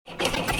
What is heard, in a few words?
gong